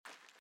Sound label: armour Light Run